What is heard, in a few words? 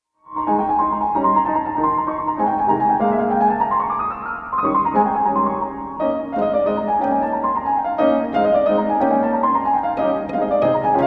quintet in a flat major for piano and strings